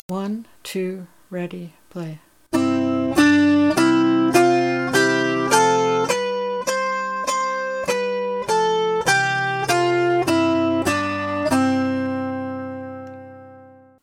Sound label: C scale 1 pinch per note